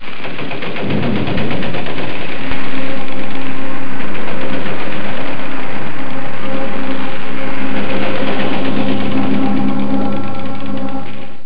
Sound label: ENGINES